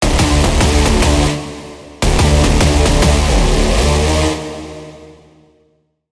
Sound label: Braam, Serum 2; Braam - Danger Zone; Routed Sound; Serum 2 Expansion - Quantum Enforcer Vol.1